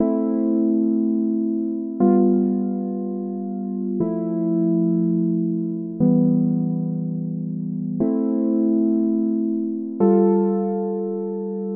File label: G 120 Piano